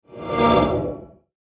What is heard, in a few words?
Whoosh Low Airy Shimmer; Blastwave